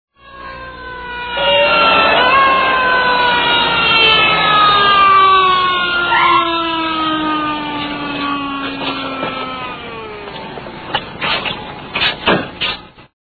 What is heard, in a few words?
siren